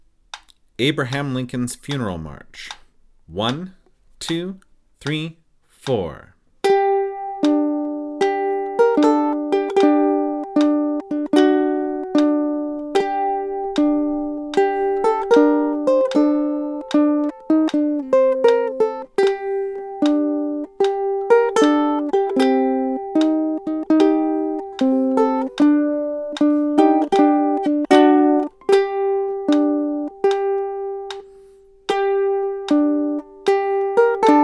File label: Funeral March